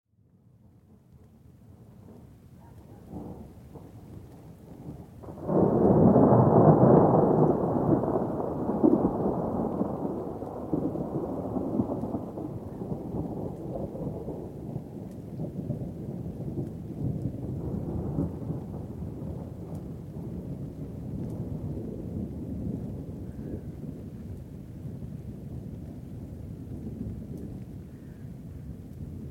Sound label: rolling thunder; eric may nobles